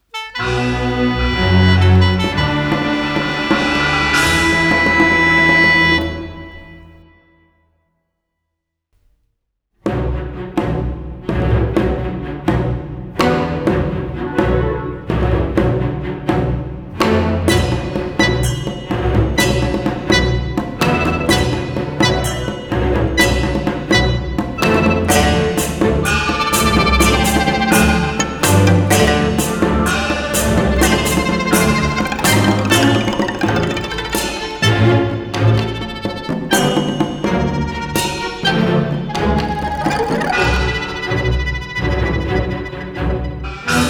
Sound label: Raucous Drumbeats; Infinity Nikki; Ink Falls Fate Rise; Infinity Nikki Original soundtracks Vol.12